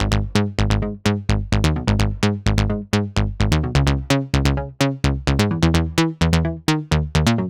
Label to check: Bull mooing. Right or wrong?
Wrong